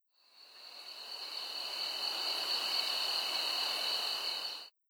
Christtheredeemer Crickets

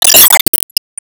Bomb

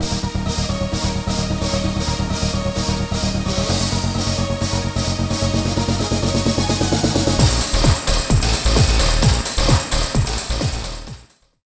Ditty; NBA ShootOut 2001; PS1

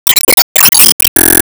Score Count Waveclear; Se Ingame Score Count Waveclear

